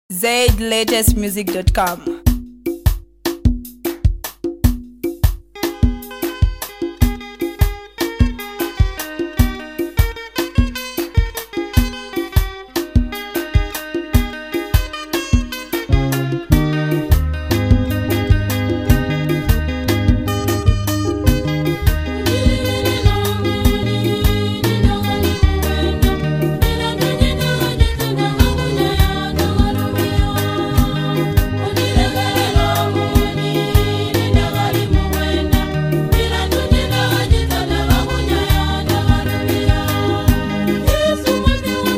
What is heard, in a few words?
choir; Assumption; Kondilekelela